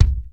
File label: ROCK KIK; BEAT2000